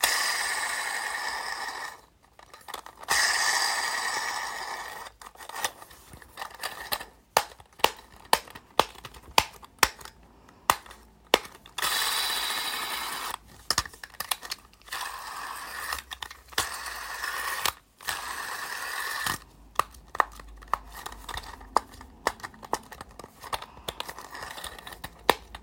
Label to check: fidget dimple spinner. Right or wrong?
Right